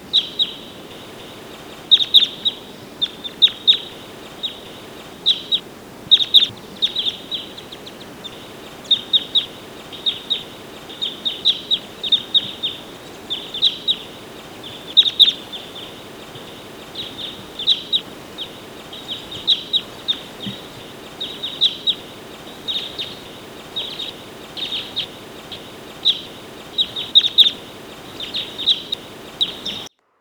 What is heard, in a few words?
Type 2 Crossbill Contact Call